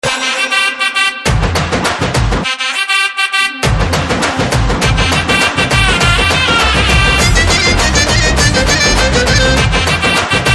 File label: Instrumental BGM Ringtone; Acharya